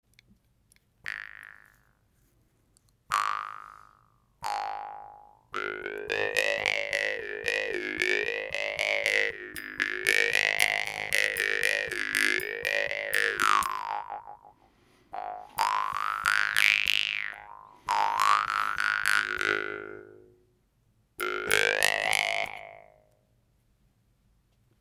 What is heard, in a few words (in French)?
genggong laiton, guimbardes